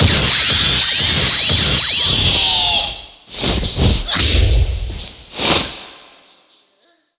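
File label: Boss Dead Bird; Super Monkey Ball Banana Blitz HD; Boss Dead Tori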